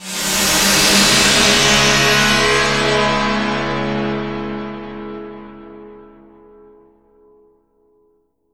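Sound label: PIANO FX; Q Up Arts; Denny Jaeger Private Collection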